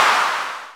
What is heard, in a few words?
PRC CLAP; Roland L-CD701